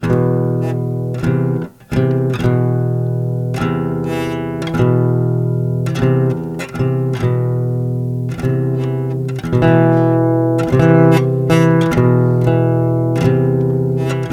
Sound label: Loop Funebre; carte blanche for a fistful of teeth; windows gamerip 2006